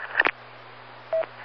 Radio Click

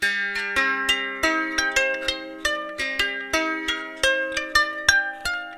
Valiha loop

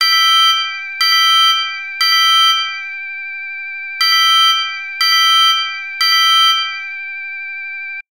Fire Alarm

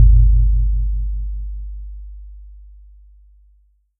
Lowkey Bass

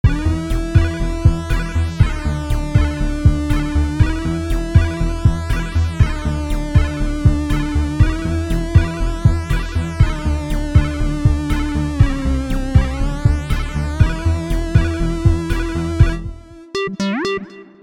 Chiptune Intro

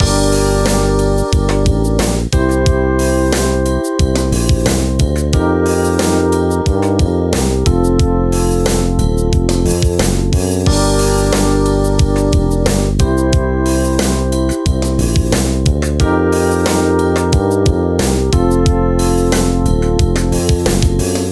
Loop

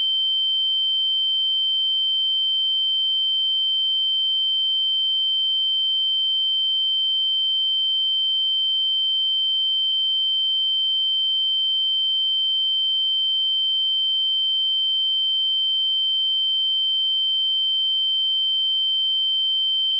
Tinnitus

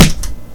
Punch

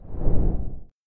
Whoosh

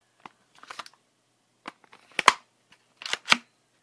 Desert Eagle Reload Air Soft

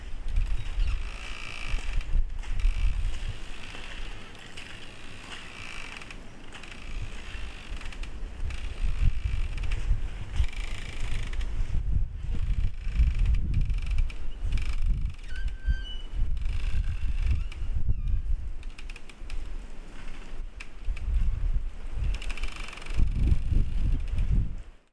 Wind

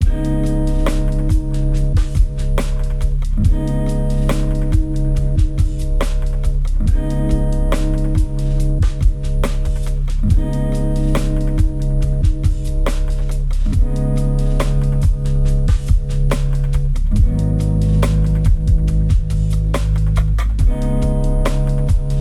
Lofi Drums Guitar 140bpm

